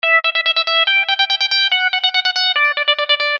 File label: Winding; OT-660